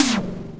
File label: Snare